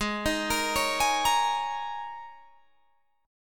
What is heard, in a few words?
Ab Suspended 2nd Suspended 4th Ab down Guitar Drop D